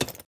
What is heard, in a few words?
ui formation